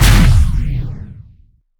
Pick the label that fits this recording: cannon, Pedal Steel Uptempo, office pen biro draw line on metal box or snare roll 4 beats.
cannon